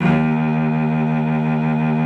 D#2, cello vib; Xsample VOL-7

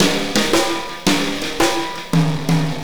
Snare Particle, Processed Drumkits; Zero Gravity Beats

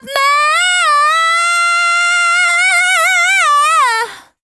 Dance Vocals, FEMALE

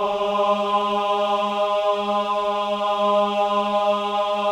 CHOIR AHS, AH G#3; Spectrasonics; Symphony of Voices

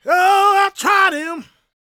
gospel male, vocal; ILIO; Vocal Planet

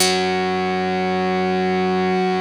basses; Wizoo - Powered Wave

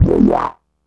Synth Hit E

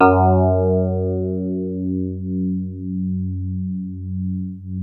DX7 hard F#2, e-piano; Music Store

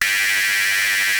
OSCAR G2, synthesizer; Hollywood Edge - Giorgio Moroder Rare Synthesizer Collection

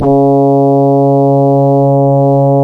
C#, Synclav FM, BRASS; Big Fish Audio

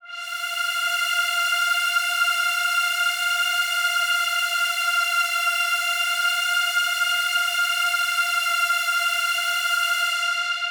Big Pad F4; KG MegaSaur

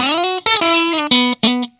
RIFF 120, Vintage Blues Guitar; USB Soundscan vol.22

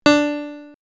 House Piano; X-Static Goldmine; backtothe70's

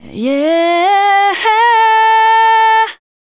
Dance Vocals, FEMALE

